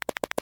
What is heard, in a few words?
settings menu outro